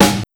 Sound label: 2000s bright snare drum sound g sharp key; modern snare drum